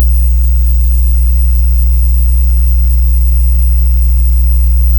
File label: faint electric buzzing